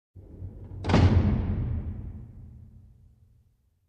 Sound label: Storage room door close